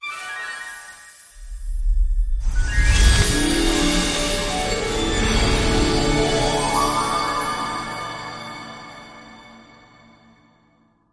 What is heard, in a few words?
random Symbol Transform Start; fairyhansel